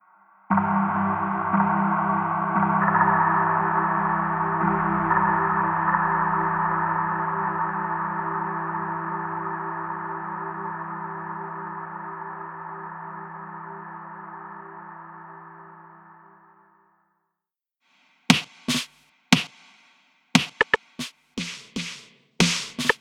Snare Loops, Airy Minor Drones; Temperance Lite

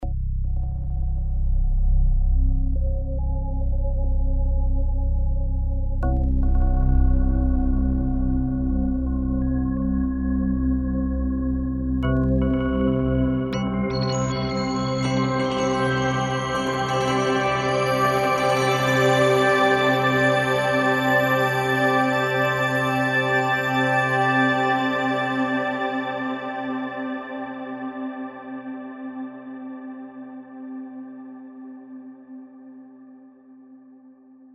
Spire Pad

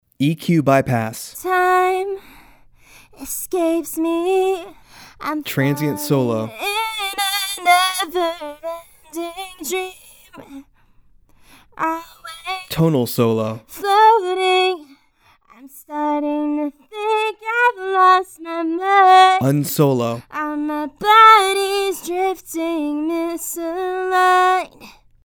Vox, ボーカル – バイパス / トランジェント / トーナル; SplitEQ

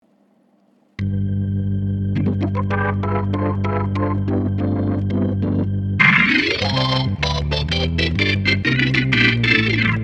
Block Jazzy; dexibell vivo s2

